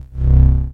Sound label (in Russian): knopka cifrovoi odinochnii spokoinii nizkii